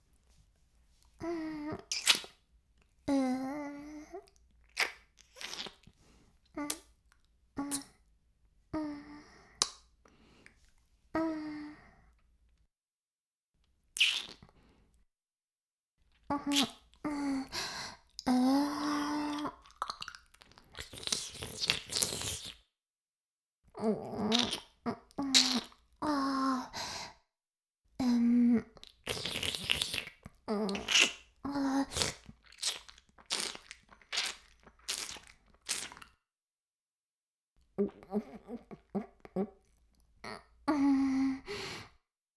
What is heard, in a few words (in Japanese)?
フェラ音声, ASMR; 白くて黒い魔性円環